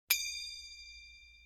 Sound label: cartoon bell; NCH Library